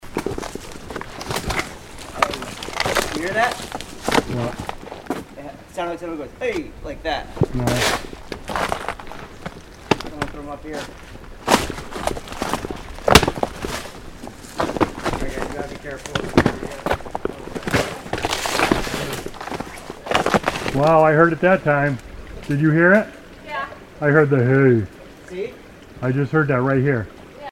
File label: Frozen Swamp